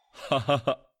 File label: ha ha ha; magus bride